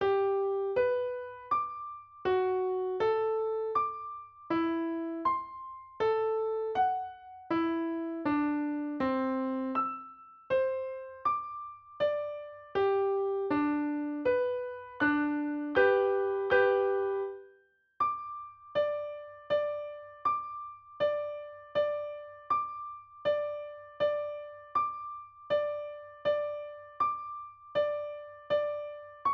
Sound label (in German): klavier; A. Salieri. Scherzo. EDV-Simulation